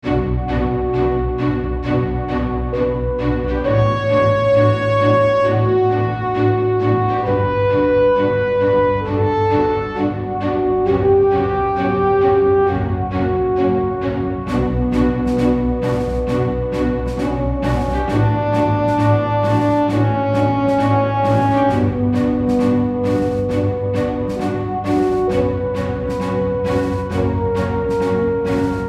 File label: instrumental; Europe 1; Campagne littéraire; Patrick Boucheron